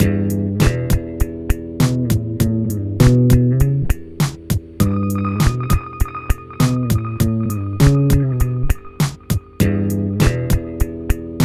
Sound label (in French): Cordes Recheche Effets Glissendo